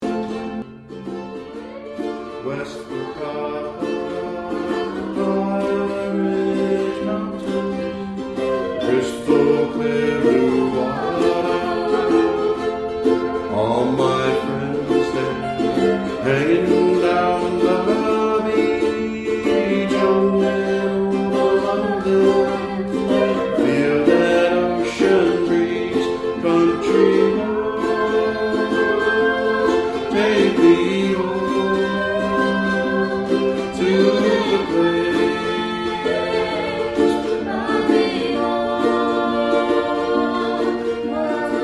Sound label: Ukulele